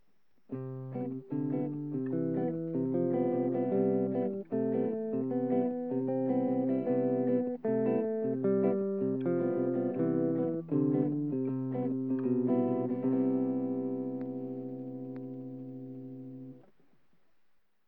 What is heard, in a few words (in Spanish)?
Jonico acompanamiento